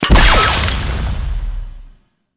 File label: Sabotage Target Destroy; Warframe; Nokko Colony